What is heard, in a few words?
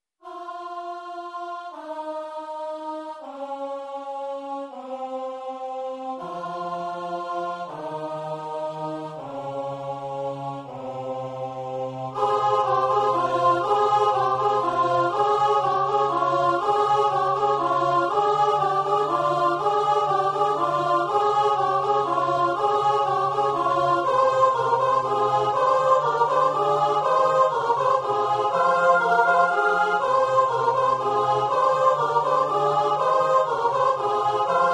Soprano